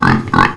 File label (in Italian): maiale, versi degli animali; animalandia